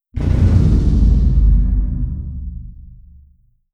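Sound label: distance shield hit layer; apocalypse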